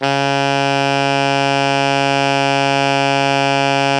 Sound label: alto FF C#2, saxophones; Greytsounds; Philip Wolfe David Crozier's Saxophones